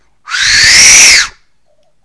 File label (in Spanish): piedra